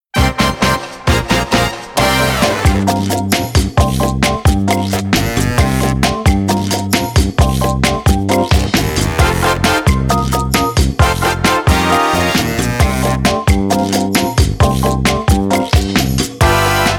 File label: Ballroom Dance Orchestra